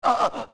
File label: Breathe; Mikau breathing heavily